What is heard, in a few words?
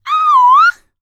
Vocal, R&B & Dance, FEM. HUMAN